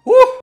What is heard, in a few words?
uuuh; davyjones